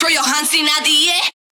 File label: vox